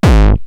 Roland TR-909 BD; BD Selection

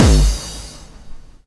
KICK PRO -L, Disco House; USB Soundscan vol.20 - Fresh Disco House I

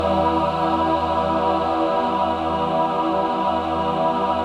min chord, Symphony of Voices

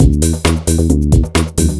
2 Step 133 MIX, House

